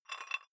dumbbell thread take off small weight gentle, fitnessstudio